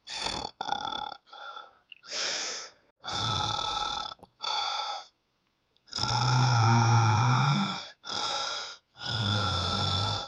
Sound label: 18 years old man holding; close mouth